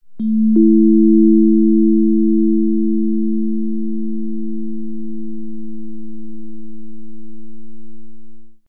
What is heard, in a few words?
zico alpha fx, Electro FX